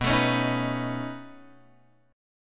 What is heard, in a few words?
B 9th B down Guitar Open F; B9 strummed; JGuitar